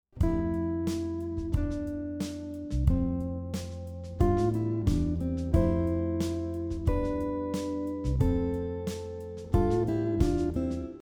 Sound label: 3rd and 6ths variation, guitar; how to harmonize a melody on guitar using 3rds and 6ths